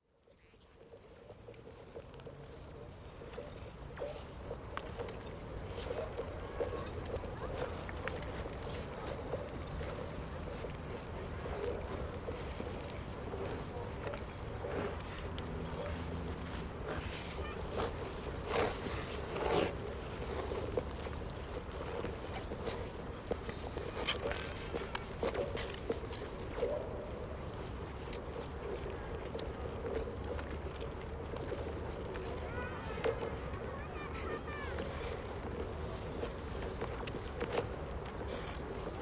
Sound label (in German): aachener weiher schlittschuhlauf an silvester; koeln